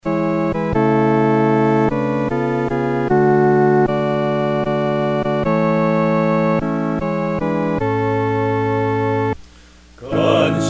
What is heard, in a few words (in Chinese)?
合唱 四声部